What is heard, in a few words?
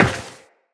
Step Metal, Footsteps, Walk; Tenno